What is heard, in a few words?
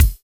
raw kick drum sample d key; Duration 0:01; Channels Mono